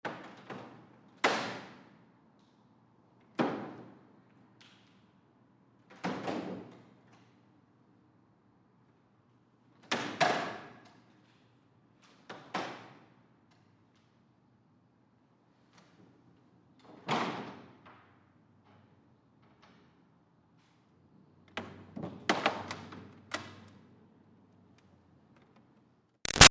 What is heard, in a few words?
Church Ambient Wood Creaks Pews Building; Creaking; Objects